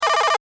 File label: Science Fiction Computer Readout Vintage